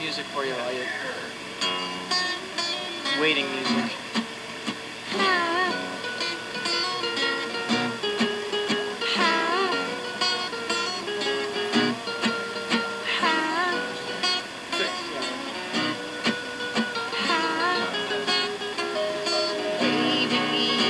waiting music

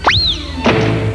wheeez

